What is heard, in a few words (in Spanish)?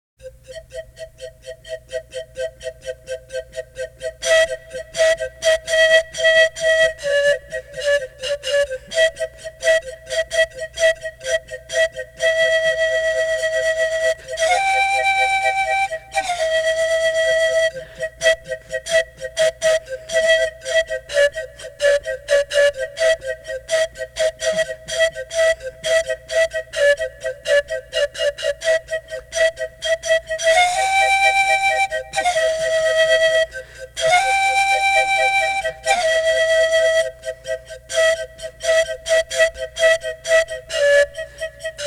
danza de vaupes, les flutes indiennes d'amerique du sud